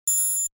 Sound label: computer error sound